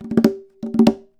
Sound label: Fill, Conga; percussion; musicradar